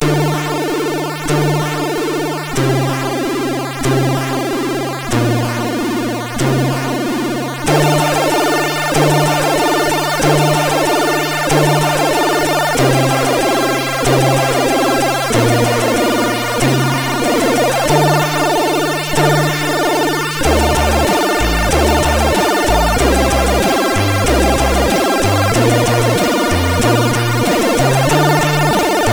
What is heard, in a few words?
Music Box tune, MOS6581R4